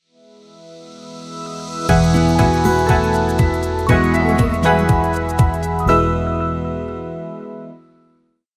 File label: elegant pop logo; muzaproduction